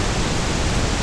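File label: steam vent loop; Star Wars Obi Wan; XBOX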